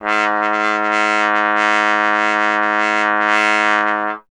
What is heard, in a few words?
Trombone G#0; Miroslav Vitous; Solo Instruments